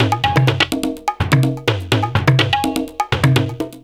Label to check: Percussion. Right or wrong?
Right